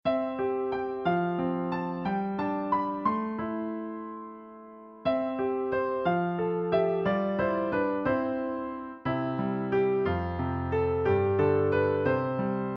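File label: humpty dumpty instrumental; The Nursery Rhymes Sidewalk Chant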